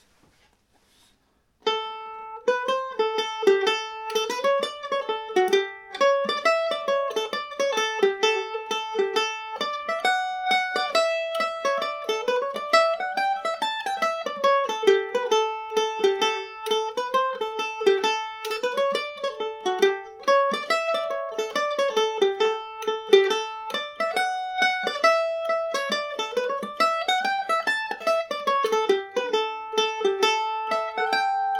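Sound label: A mixolydian, Irish mandolin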